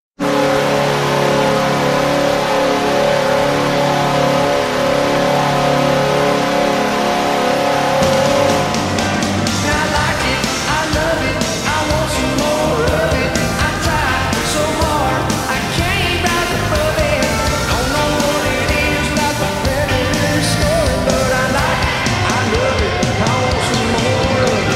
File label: goal; Preds